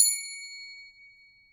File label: Chime, EMU Orbit-3 drum machine; 300 Drum Machines